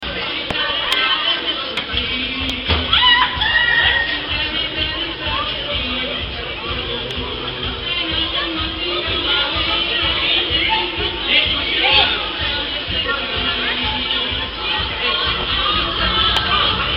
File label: french music